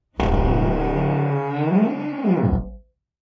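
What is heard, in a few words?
door creak large, squeaks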